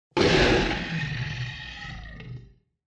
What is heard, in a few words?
Monster